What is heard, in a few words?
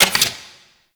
Fist Movement; Enemies; Warframe; Tyl Regor; Fists